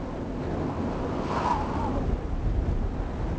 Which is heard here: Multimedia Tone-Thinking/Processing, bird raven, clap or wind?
wind